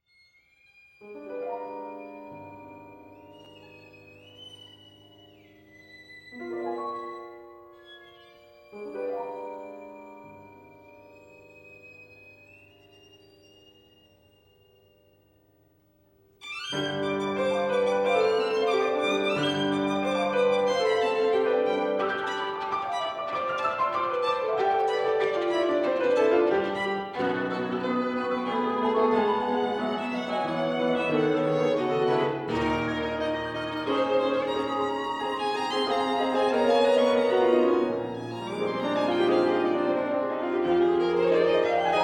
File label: Folk Dance; chamber